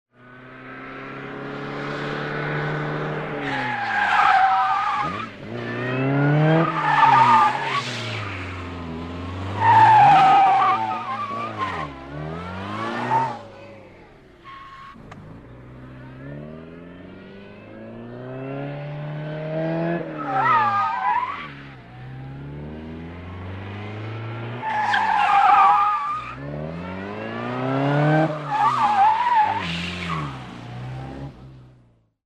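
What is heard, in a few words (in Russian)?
многочисленные развороты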